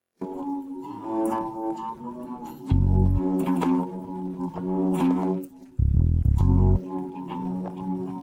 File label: happy no vocals